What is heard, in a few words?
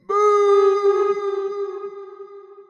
booom reverb